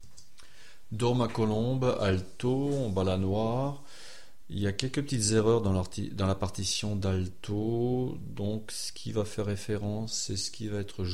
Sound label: alto; Dors ma colombe